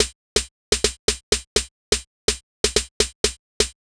perc terry 125, Percussion Loops, Classic Chicago House